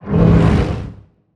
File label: damage; ENDWORM